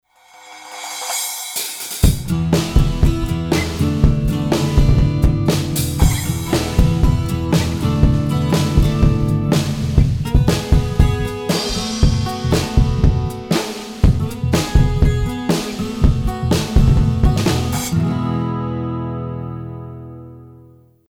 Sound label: Song Mix Sat Wet; Lewitt LCT 1040